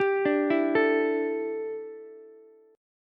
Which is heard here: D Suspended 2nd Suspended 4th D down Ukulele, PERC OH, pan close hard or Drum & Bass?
D Suspended 2nd Suspended 4th D down Ukulele